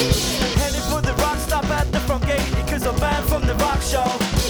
Vox Balance No Comp No Auto

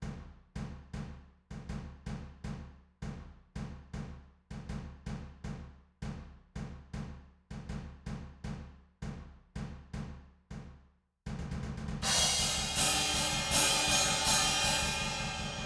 Room Notch In

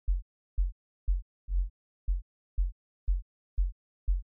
Kick Sub Solo

